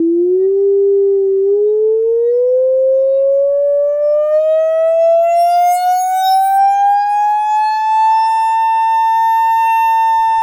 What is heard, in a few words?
Test vibration resonance